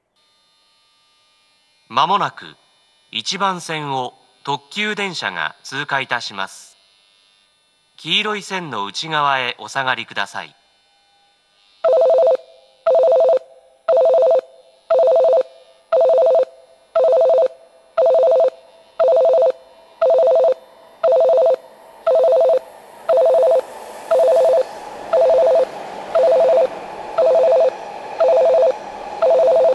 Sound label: pass limited express, station; tammachi